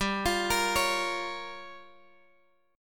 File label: G Minor 7th Flat 5th, Guitar Open D